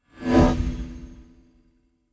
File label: Simple Transition